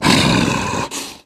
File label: tank breathe; voice; idle; Left 4 Dead 2